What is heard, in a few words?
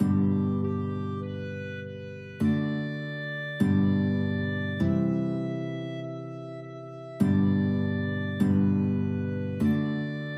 holy holy holy clarinet guitar duet